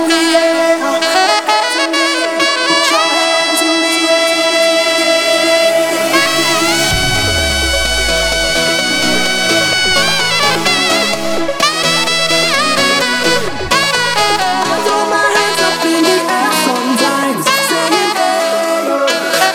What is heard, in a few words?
Sax & DJ